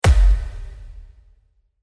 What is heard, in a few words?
Epic Hit; افکت صدای ضربه سینمایی